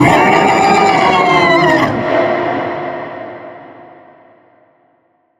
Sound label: Jump Scare Screaming Face